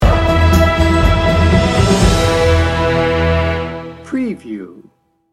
spaghetti western loop

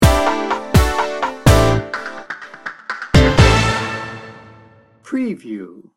downtown funk sting bumper

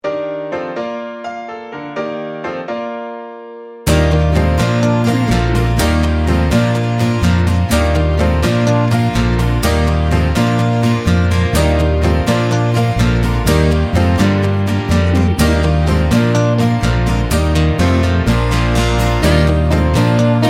winning hand no drums

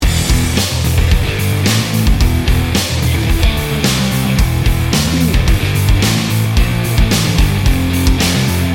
hard rock grunge loop

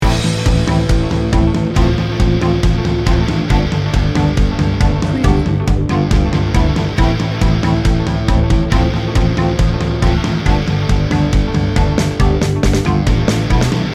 struggle and triumph loop

